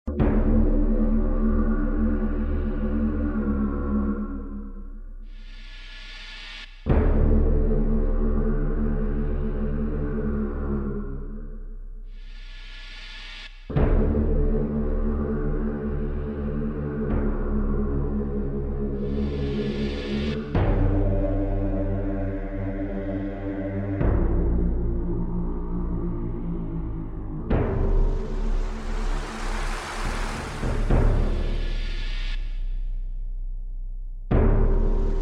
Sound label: dungeon